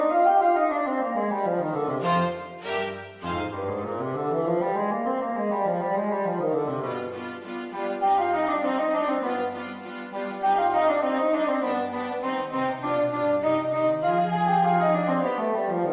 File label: Quartet